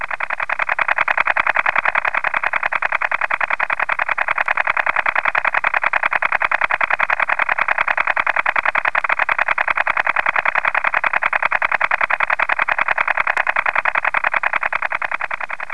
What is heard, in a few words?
VLF LORAN navigation